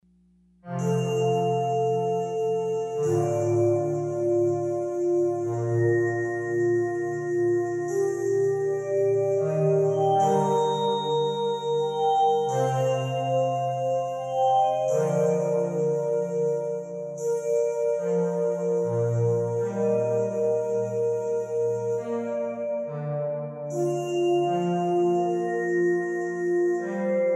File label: Fanfare; Yahoos With a Mic